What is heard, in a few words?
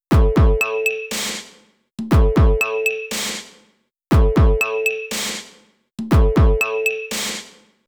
drum loop